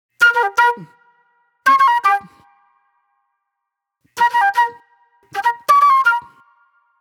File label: Fast Funk Flute; Sonic Foundry (Sony Creative Software); Manhattan Flutes; The Funky Mama